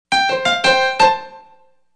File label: Alert